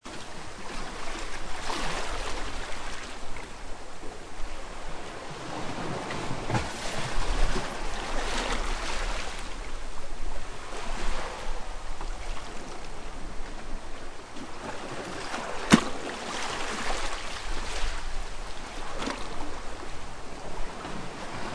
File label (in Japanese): 岩間に打ち付ける波